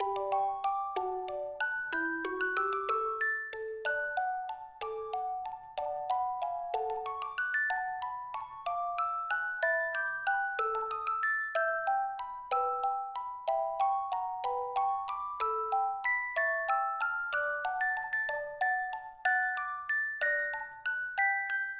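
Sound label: Underwater Night theme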